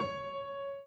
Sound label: Piano Chord